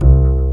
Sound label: WOOD, WOOD BASS; East West - Global Sounds